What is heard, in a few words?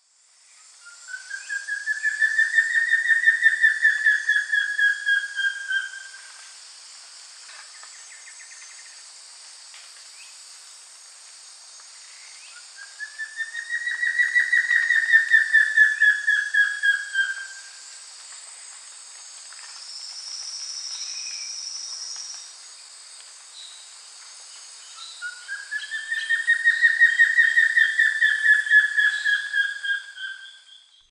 Xiphorhynchus guttatus, song; S. America; Eastern Lowlands